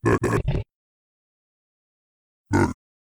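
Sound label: zombie pig angry; Minecraft; Conquest Default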